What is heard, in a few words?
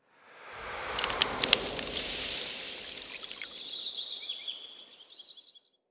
season change spring